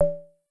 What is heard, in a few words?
skill assign; dos lem